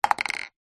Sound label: container small plastic empty down on wood